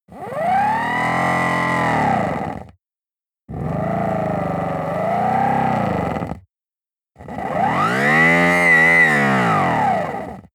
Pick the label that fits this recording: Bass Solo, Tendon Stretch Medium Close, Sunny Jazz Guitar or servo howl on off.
servo howl on off